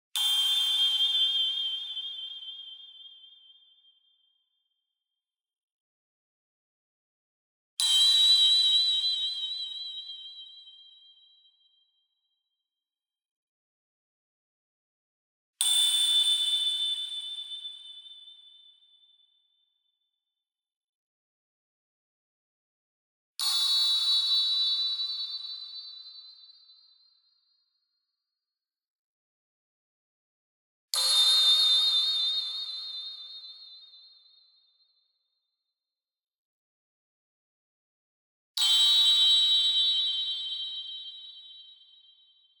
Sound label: BLING Metal Sparkle Vibrato; boom library; Anime Essentials